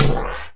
flange up sd; single hits

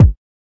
kick

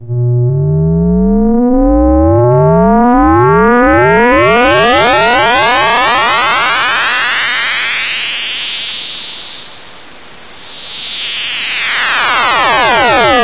moog powers up and down; Electro and Synthetic

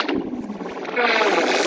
switch turns on evil machine; Electro and Synthetic

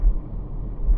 engineroom loop; LOOPING AMBIENCE

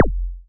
laser bd, Kicks; single hits

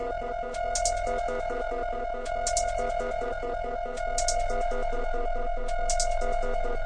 140 detroit way quiet; 140 Detroit Reaktor

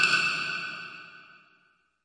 double reverb stick, snare; single hits

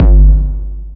dark verby kicker; Kicks; single hits

